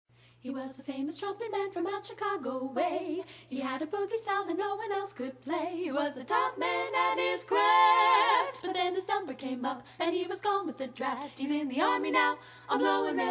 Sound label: Acapella